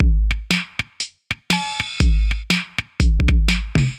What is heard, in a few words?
Amplify 808 Kit, Drums; Ableton; Factory Packs; Vibrations; ModeAudio